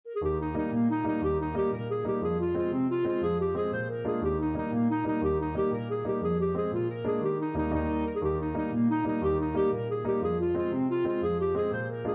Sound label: irish jig medley, clarinet